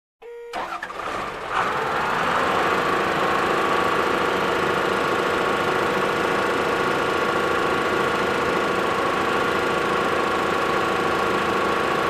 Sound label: Construction